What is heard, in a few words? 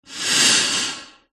human blowing air into plastic tube